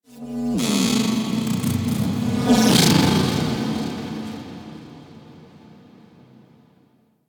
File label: Tech Texture Med, Ambience; Warframe; Cephalon Weave; DE